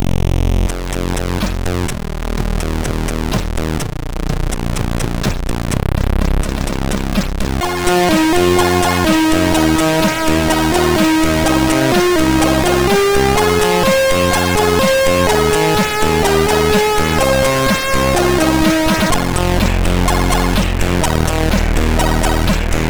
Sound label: Acidtime sid MOS6581R2